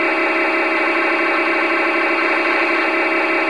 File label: edacs norm, scanner